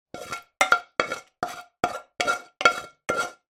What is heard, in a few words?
food pasta scrape from pan with wooden spoon; sound effects one